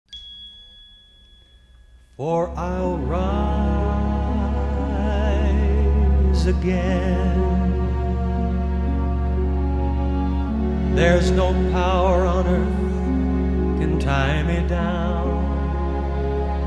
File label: Mono Band & Vocal Mix